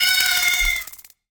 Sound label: Flying Insects, death